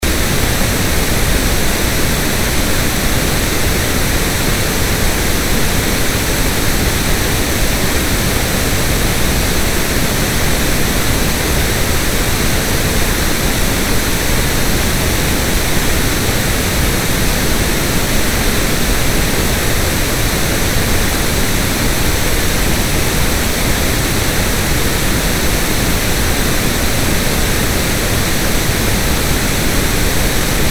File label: pink noise